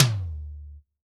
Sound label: Tom, drum